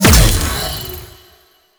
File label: Proc Block Ability Impact; Warframe; Warframes; Helminth Abilities